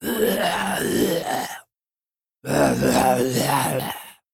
zombie

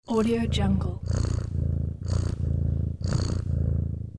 purring

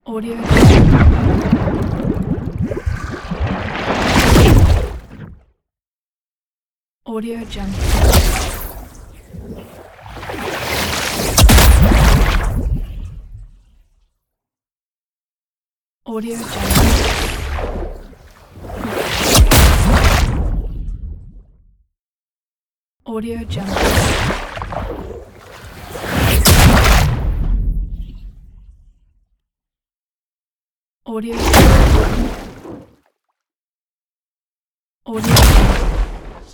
water cast

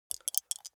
foley dice small two rattle in hand closed short; smartsoundfx

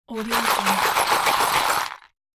small toolbox hard shake rattle

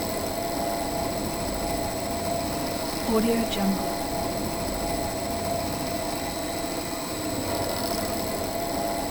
ambience metal cutting machi; Robotic Lifeforms 2

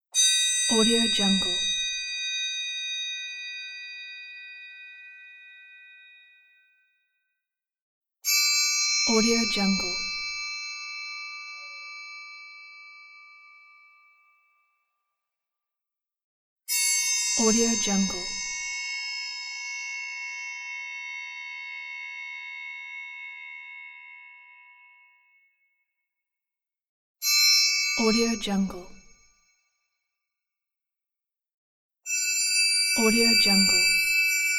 orchestral triangle

